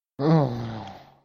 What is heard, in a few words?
ooohhh